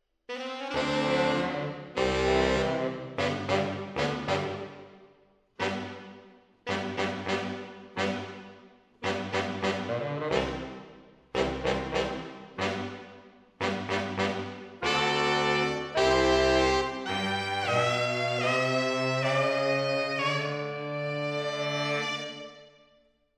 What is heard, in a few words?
MKH AB, piano